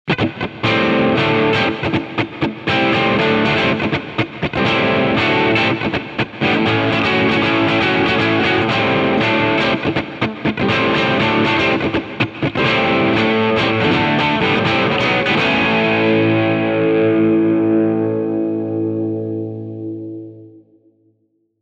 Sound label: SPACE CHAMBER; xgear